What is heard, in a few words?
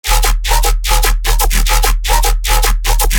bass loop 150 D#; Virtual Riot